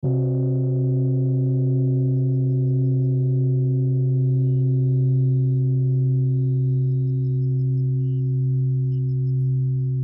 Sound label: Only Gongs & Crickets; Meditation Helper; Meditation Boosters; crystalbundle